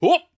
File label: yelp